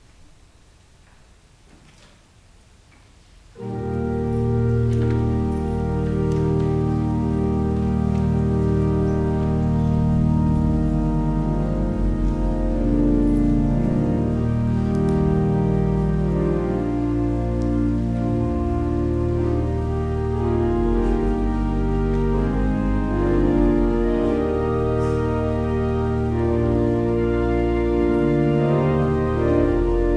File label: Organ Music; 1 john 3 1 3